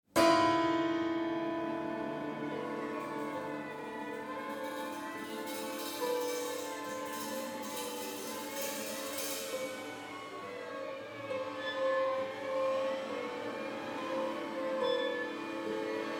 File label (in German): Inlandeis und Frost Wind